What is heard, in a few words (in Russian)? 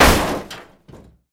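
короткий одиночный выстрел по металлическому предмету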